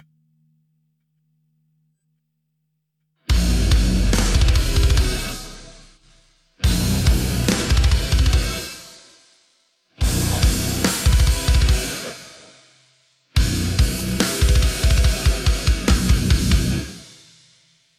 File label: Instrumental